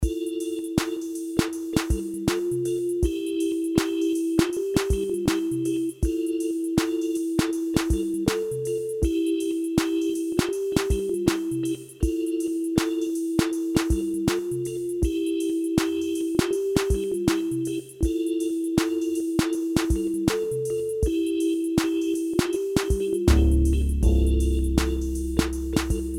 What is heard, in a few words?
Midi